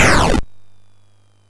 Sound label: Frantic, MOS6581R4